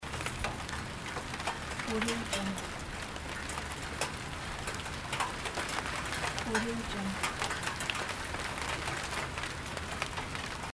light rain on metal surface loop

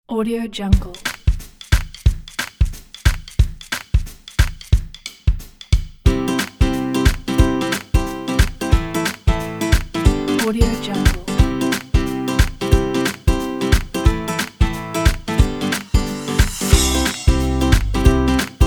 happy upbeat

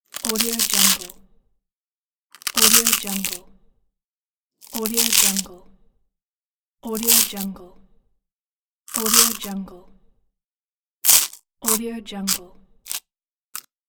toast bite

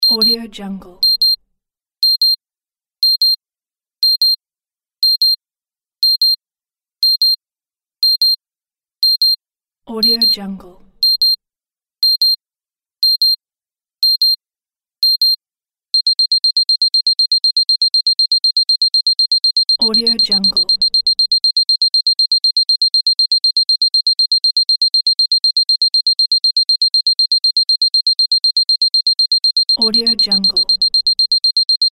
alarm clock

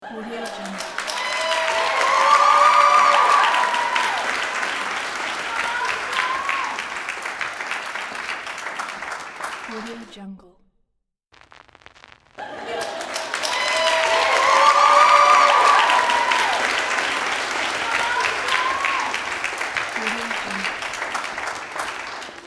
laughter into wild applause

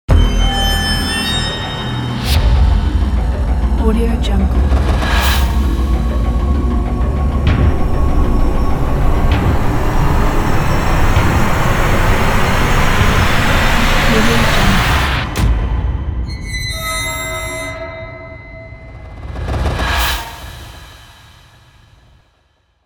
dark suspense logo

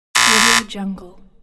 wrong

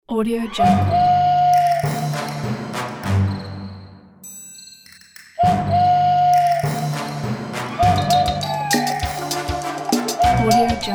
cartoon crazy night

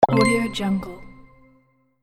pop and reveal

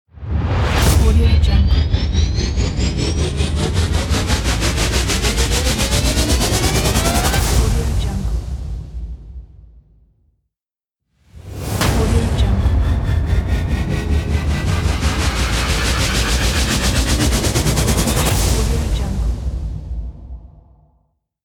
tension build up

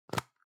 object plastic can lid screw open hard

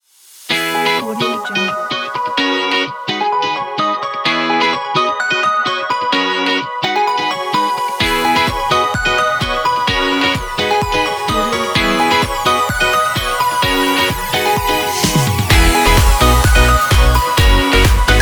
happy dance pop

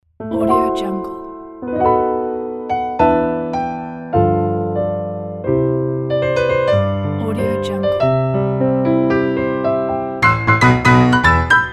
comedy wedding march

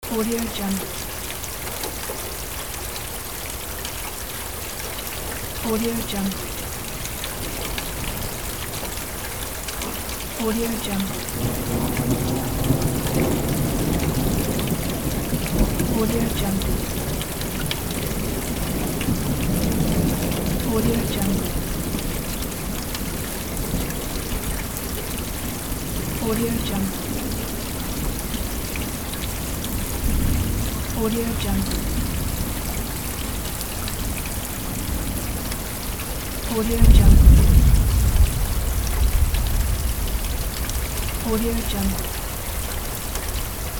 thunderstorm ambiance loop

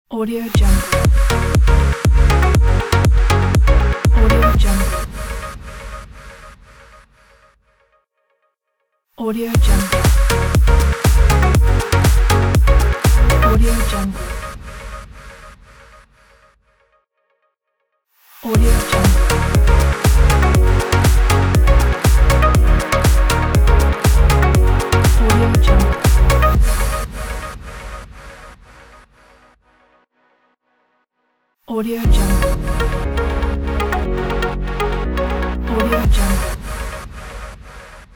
pop corporate logo

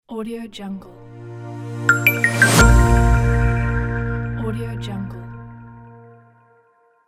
corporate clean logo

